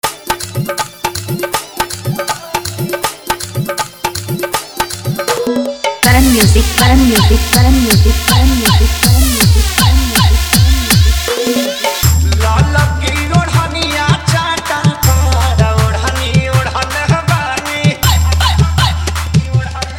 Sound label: Old Song Jhan Jhan Bass Hard Bass Mix